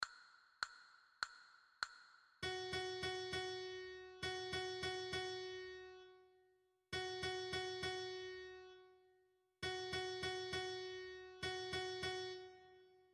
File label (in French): Fa diese, flute a bec